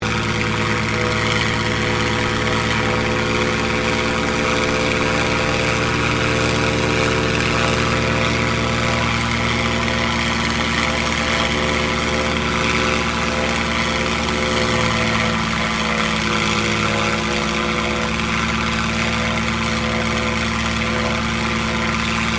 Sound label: AIRCRAFT CAC CA-18 MUSTANG MK23 OPERATING HIGH STEREO, WW2 Mustang Fighter Plane; Airplanes; SoundEffects-Foundation1